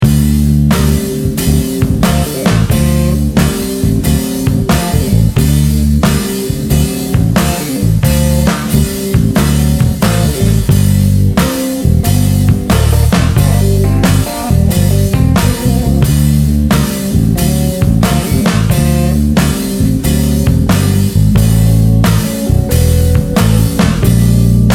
Blues, bass; groove

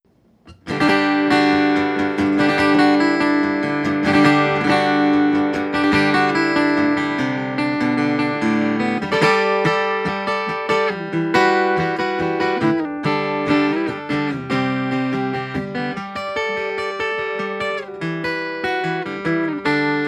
Yamaha FGX5 Test Mic normalized

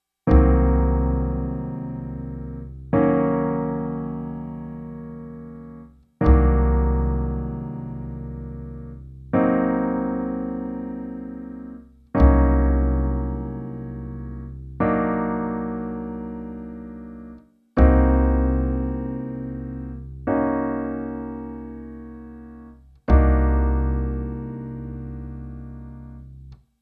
LH rootless half diminished bass

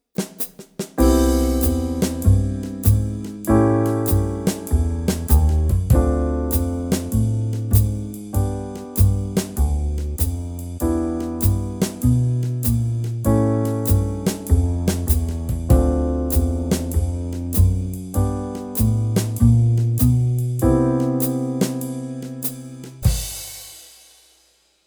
No Bass

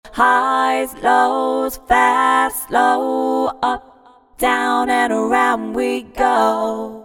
high parts toward center

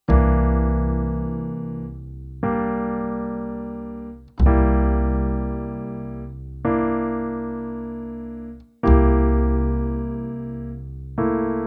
LH rootless Dominant bass

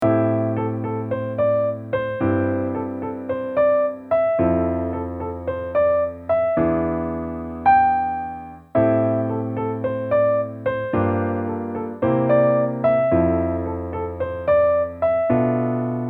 Pop tune melody

